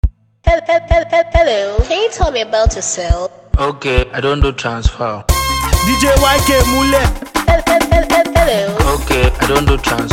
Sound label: INSTRUMENTAL Beat